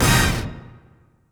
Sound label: Orch Stab